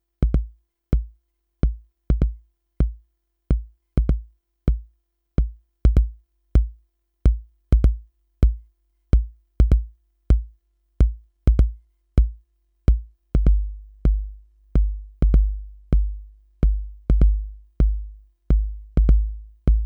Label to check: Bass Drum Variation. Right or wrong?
Right